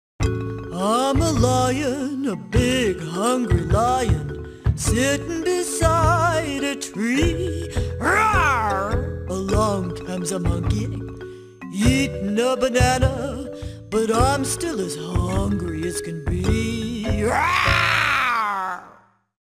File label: Lion Song; JumpStart Toddlers